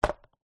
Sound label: food drink empty cup paper ice down on table fast food